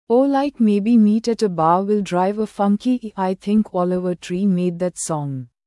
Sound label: funky ehhhh